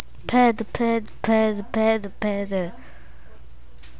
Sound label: pad